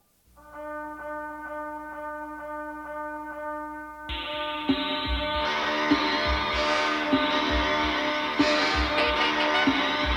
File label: sax hell